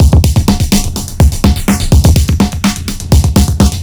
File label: Rumble, percussion